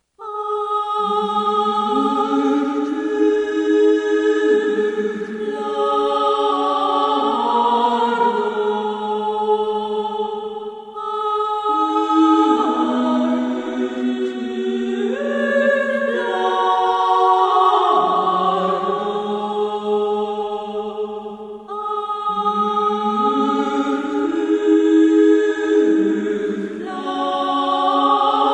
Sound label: throne; svencoop; sc castlevania